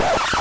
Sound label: the record scratch